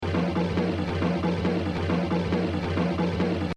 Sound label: Exercise, jazz drumming; Art Blakey; The Evolution of Jazz Drumming (Gottlieb)